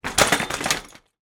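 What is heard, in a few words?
bicycles x2 impact; transport; bicycels x2 impact; zapsplat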